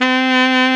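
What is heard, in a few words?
big band fast baritone sax; Roland L-CDC-01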